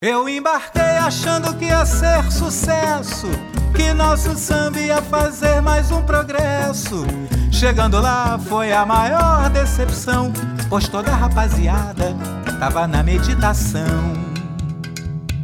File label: Samba